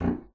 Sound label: bassoon A#0; Xsample VOL-6; C BASSOON